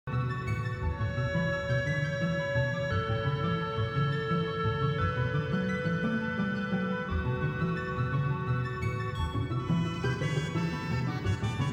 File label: after, MatrixReverb